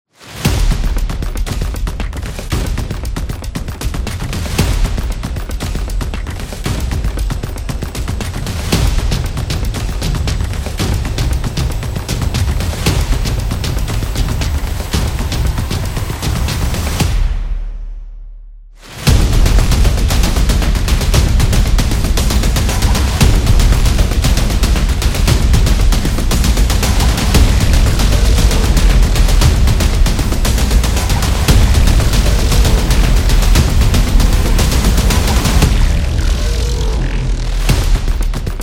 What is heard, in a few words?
Action Dark; war action